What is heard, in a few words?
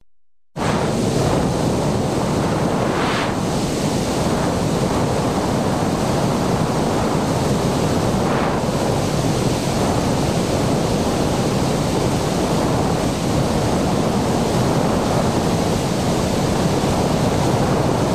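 sound of a steam jet continuous variant 2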